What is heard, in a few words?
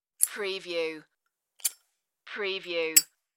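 Open Lighter Close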